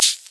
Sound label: Dance Drums; Best Service ProSamples vol.15; AKAI